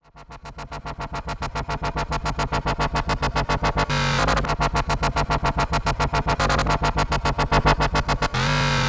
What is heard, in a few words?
bass